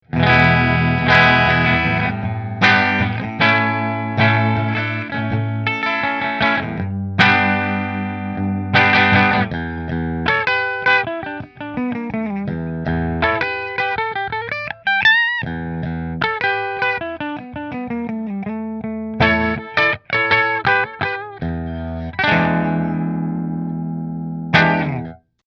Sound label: Nola JB14 Bridge Fender, guitars